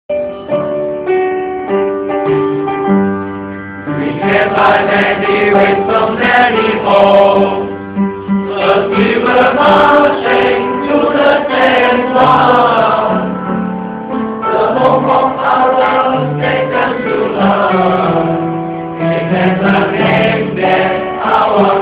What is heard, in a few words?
singing melody